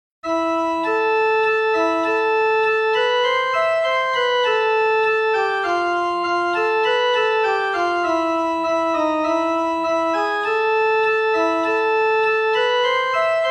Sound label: Solo organ ver.